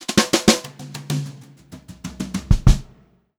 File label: FILL, Brushed Drum Loops